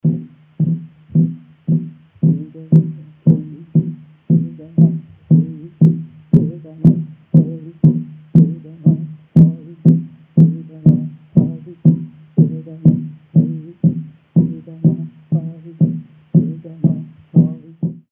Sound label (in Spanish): tambor agua; toba